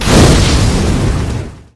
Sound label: firey explosion